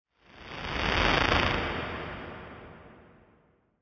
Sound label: Riser